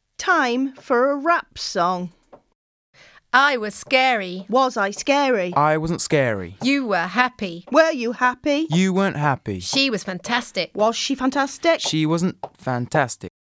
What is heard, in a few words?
Rap song